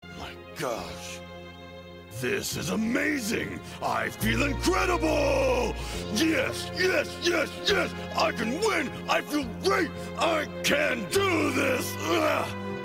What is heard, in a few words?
Motivational Piccolo